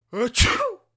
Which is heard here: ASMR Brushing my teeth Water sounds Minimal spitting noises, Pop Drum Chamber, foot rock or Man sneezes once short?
Man sneezes once short